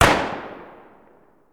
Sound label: Colt M16 exterior shot, colt m 16 exterior single shot